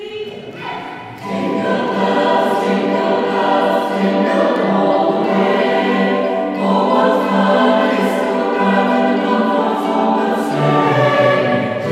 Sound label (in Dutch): Jingle Bells, Koor